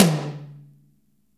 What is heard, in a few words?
TOM POWER, Drum Kits; Roland L-CD701; LA Fat Kit 1